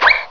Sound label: Cartoon Sound; PC; MARIOSFUNDAMENTALS